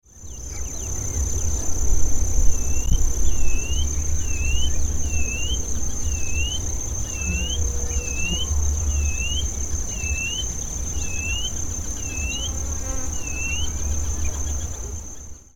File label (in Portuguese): Sporophila leucoptera Chorão, macho